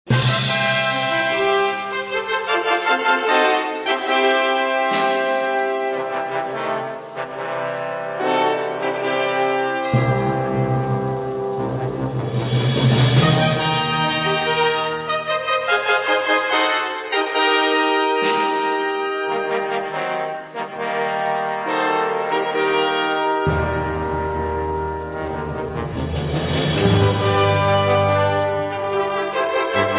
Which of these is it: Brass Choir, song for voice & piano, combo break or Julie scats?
Brass Choir